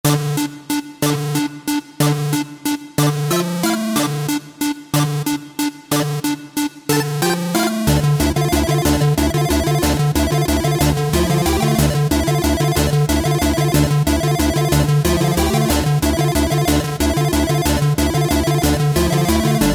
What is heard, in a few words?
Battle Games 8 bit